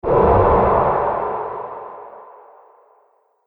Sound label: Epic Sf Explode Impact; Sci Fi Sound Effects